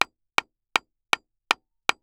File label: Industry Tool Hammer Hammering Nails Into Wood